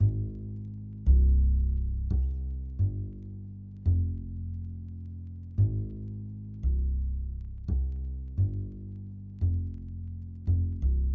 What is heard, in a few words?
Lofi Melody Loop - 86 BPM C Min Bass; Melodics; Loops; Loop Stems; Cymatics; Eternity Sample Pack